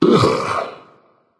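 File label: nonverbal ugh; smite; voicepack; merlin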